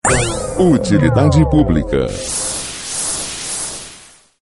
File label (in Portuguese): vinheta